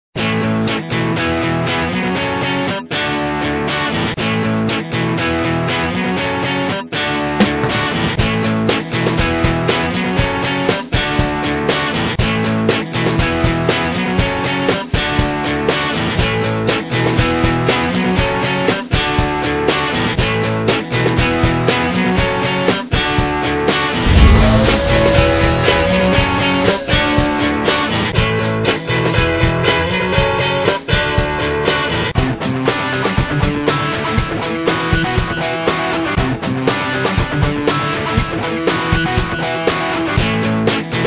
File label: Rock